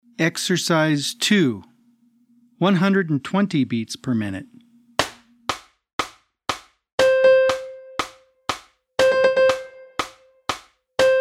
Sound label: 120 BPM